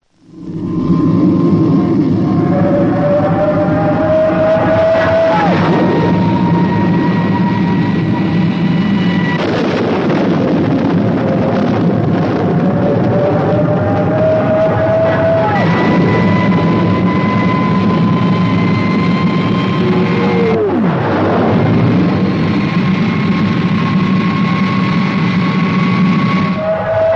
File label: Special Effects no water; Supercar